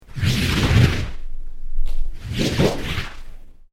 Created Creature Medium Vocalise, Alien Sound Effects; Created creatures, vocalisations and pretend speech